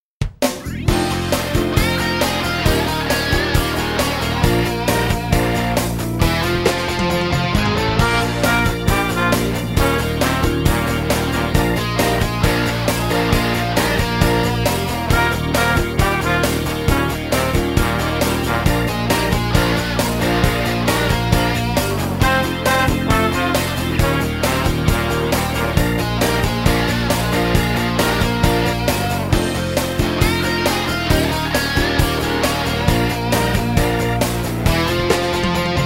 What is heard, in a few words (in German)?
trompetentaxi